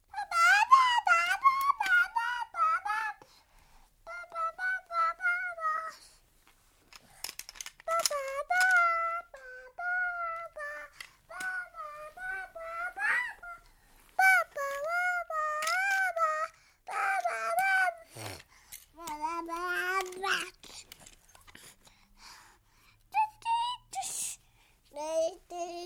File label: 16 month old baby boy, playing with a toy, makes vocal siren noises; Babies; BBC